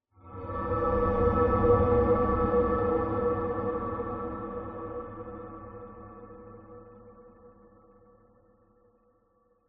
accent chord dark distant low resonant ghostly desolate eerie; skyclad sound